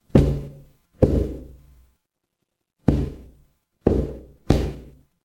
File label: footsteps wood